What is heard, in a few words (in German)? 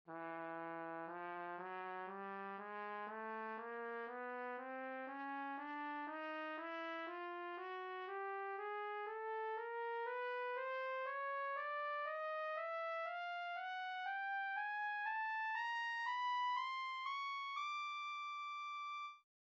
Trompete B; Instrumentenkunde